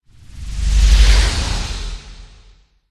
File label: dragon warrior